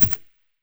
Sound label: scar silencer mono far